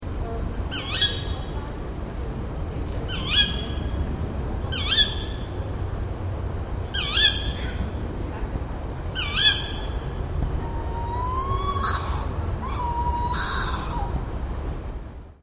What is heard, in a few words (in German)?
steinkauz